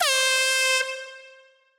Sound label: air horn